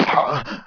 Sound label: player land; PC Gamer IT CD 41; Daikatana